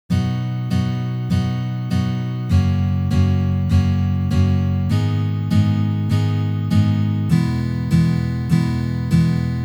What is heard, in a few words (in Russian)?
Uprazhneniya dlya igry akkorda Si mazhor na gitare